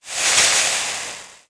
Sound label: sand shot; World of Tanks Blitz